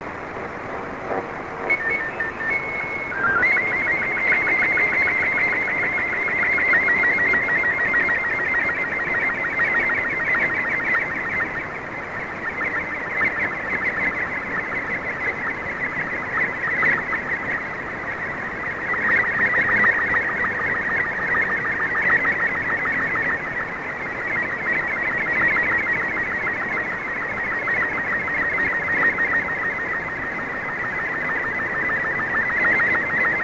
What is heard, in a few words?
sstv